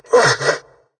redout short; War Thunder

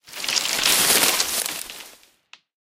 tree twist; tanks; War Thunder

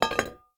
drop metal hollow; Rust

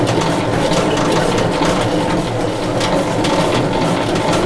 Vehicle Weapons; Red Orchestra 41-45

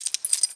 animal dog collar short; Call of Duty Modern Warfare

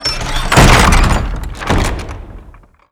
door slam brace; Modern Warfare

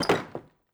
shutter wood close end; Rust

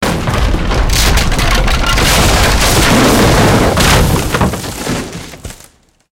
wood building fall mid

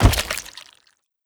flesh; Rust

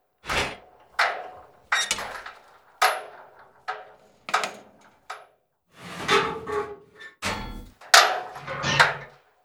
ferris wheel rock

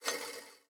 spinner wheel spin accent; Rust

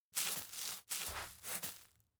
scratch floor; Rust

